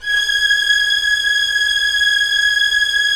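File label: Strings Violins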